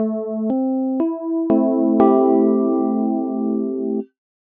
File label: A Minor Mix